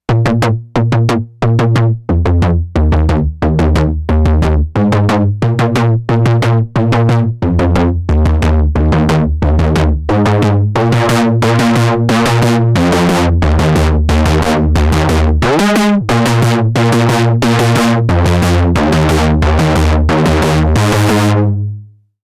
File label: Overdrive Bass; TEO-5